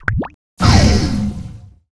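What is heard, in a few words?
King Slime Attack Skill; Seer; GamigoNA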